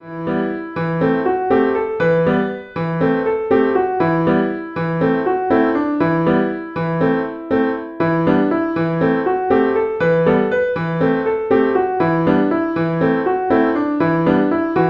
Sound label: elementary piano solo